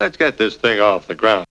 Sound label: VOX